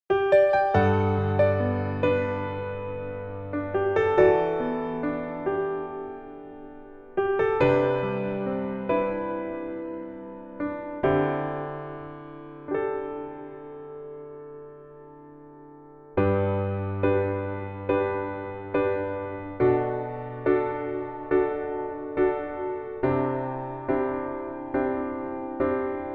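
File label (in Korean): Piano version 여자키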